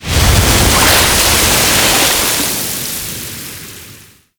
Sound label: Sealab Wave Crash Large; Warframe; The New War